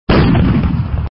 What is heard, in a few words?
bomb; neimeng